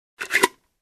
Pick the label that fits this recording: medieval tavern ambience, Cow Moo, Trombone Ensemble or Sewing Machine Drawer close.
Sewing Machine Drawer close